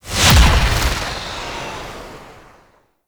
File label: Fly By Quick, Vehicles Flight; Warframe; Liset; Stalker Liset Skin